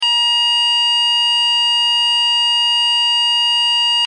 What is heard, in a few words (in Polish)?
ton